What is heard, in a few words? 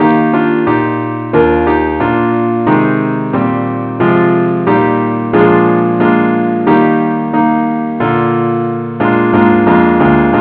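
keyboard Only key e flat, a Welsh Folk Tune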